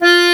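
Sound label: bayan F4, Russian; EdgeSounds; Drum Mashines VOL-1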